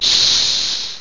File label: acid hiss